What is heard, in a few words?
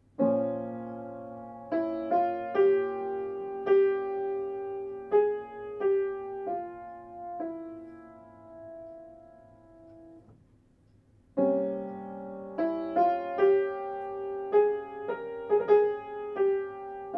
Klezmer